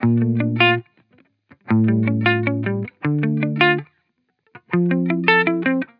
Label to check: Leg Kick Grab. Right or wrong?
Wrong